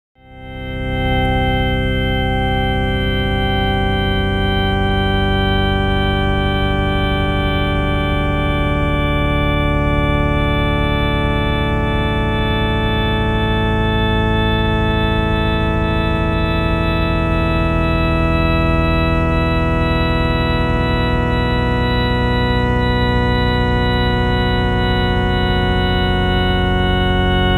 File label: More Shepard Examples